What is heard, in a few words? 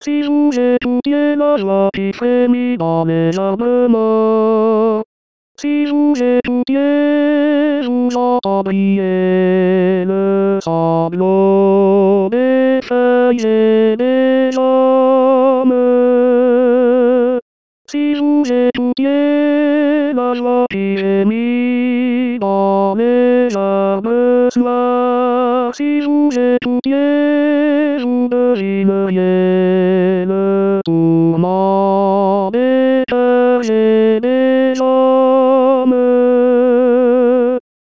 tenor; rimailleurs